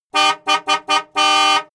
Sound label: car jaguar e-type honk sequence close